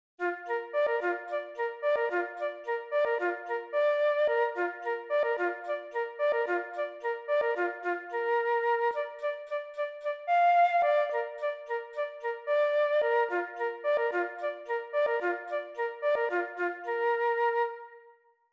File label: Key of Bb, flute; Reveille